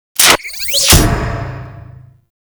Minigame Success; DE; Warframe; Grineer

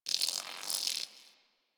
Rock Critter, Ambience; One Offs; Creatures; Warframe; Quests; The New War; Drifter Camp

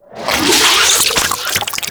Exterminate Hive Shell Grow A, Infested; Warframe; Infested Eris; Encounters

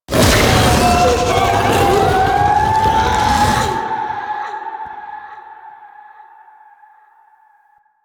Reanimate Ability Cast; Warframe; The New War; Archon; Amar; Abilities

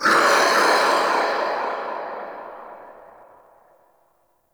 Ghost Attack, Vocals; Enemy; Nightfold Test Range Ghosts

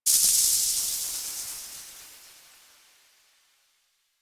Tengu tornado end; Warframe

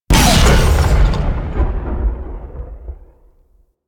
Long Gun Alt Shot; Warframe; Thano Tech Arch Long Gun

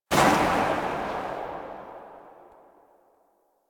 Pistol Tail; Weapons; Warframe; Tenno; Priest Pistol

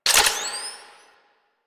Shotgun Quick Reload Layer; Weapons; Warframe; Pax Duviricus

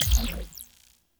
UI Mod Screen Close; Player Ship; Warframe; Railjack

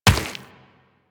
Run Rock, Footsteps; DE; Warframe; Tenno

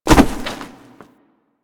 Hit Ledge, Foley; Warframe; Tenno

